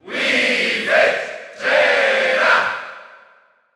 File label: Cheer German; Wii Fit Trainer Male; SSBU